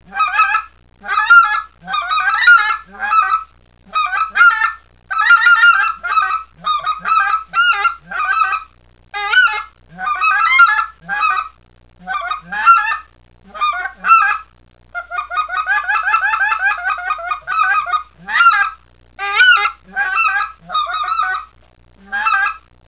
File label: Crusher Low, Speck Calls